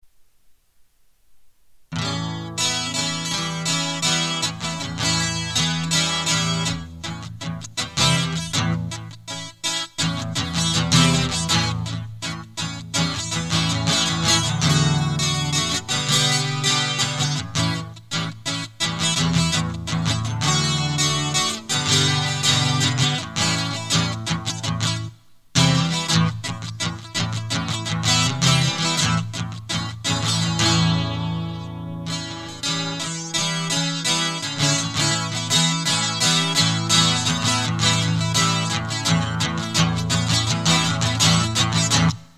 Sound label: Instrumental